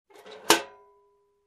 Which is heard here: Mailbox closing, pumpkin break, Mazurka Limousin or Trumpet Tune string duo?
Mailbox closing